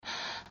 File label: Breath; Lewd SFX